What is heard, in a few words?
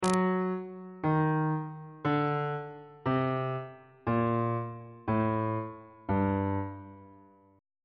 g harmonic minor scale descending on bass clef